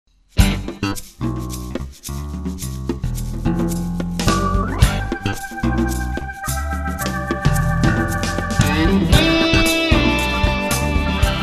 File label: Funk